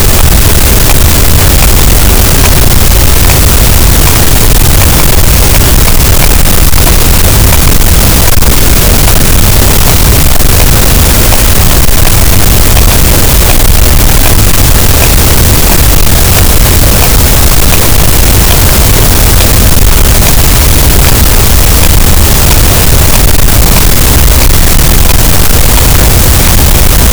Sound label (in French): cachalot, Physeter Macrocephalus, gaussian noise